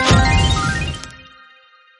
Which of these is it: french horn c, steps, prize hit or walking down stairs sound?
prize hit